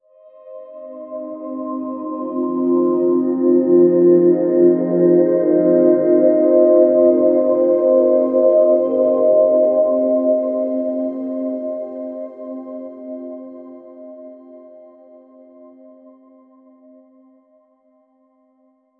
soft ethereal pads angeli; angelic

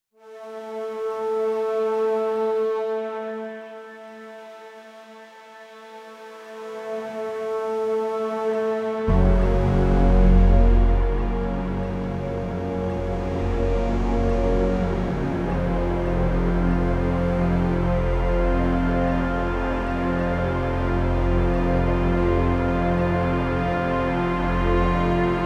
create an epic orchestral, brass

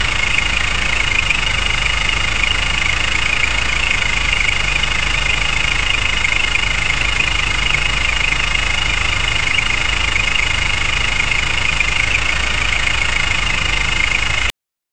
engine 2 cylinder, machines

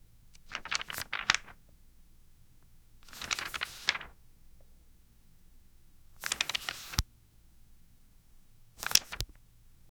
flipping magazine or news

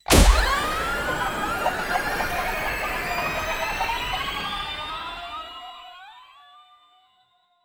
solar power up attack

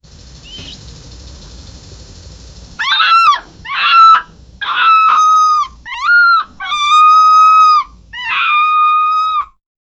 foxes are screaming; animal vocalizations

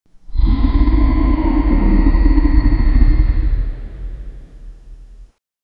menacing yeti roar sound; vocalizations

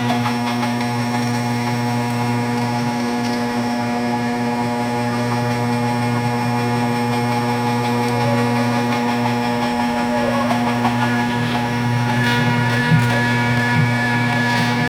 workout machines, gym

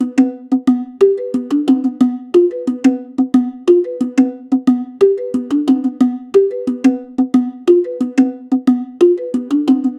playful and upbeat jungle

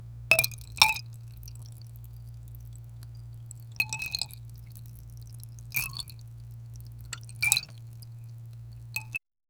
liquid glass flows soft

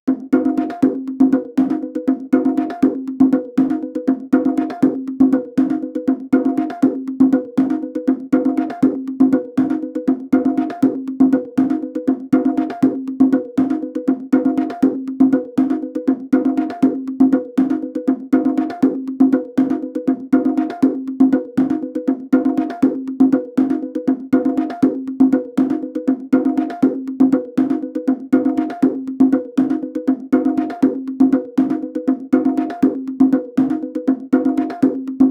game hectic stressful, drums

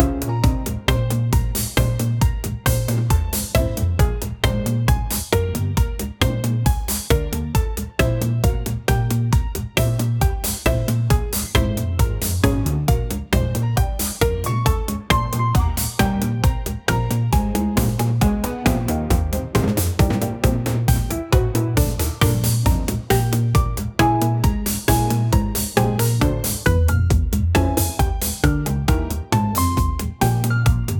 funky tropical beat groov, funk

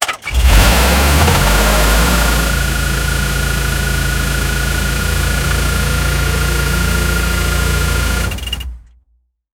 engines

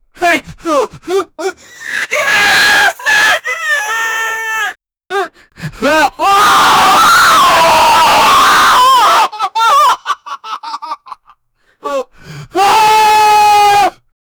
a chaotic reaction clip

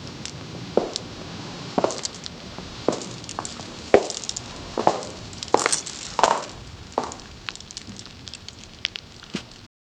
slow heavy footsteps, creaking

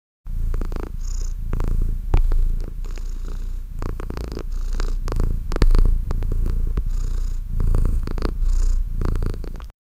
cats calm purring

